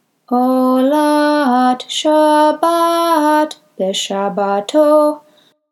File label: rosh chodesh torah reading aliyah 3 phrase 20